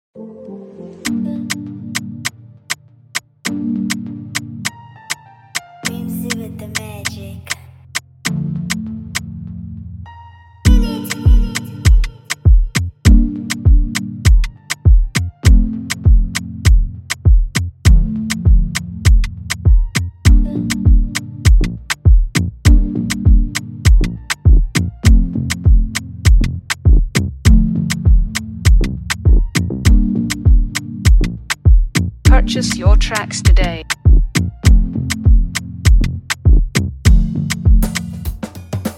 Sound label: Afrobeat Instrumental